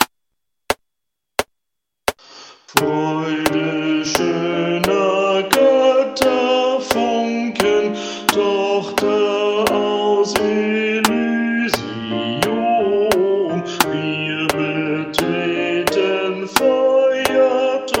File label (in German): Ode an die Freude klassisch Bariton